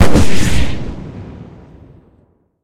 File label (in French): Carburant Start; Puddle